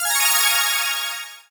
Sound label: start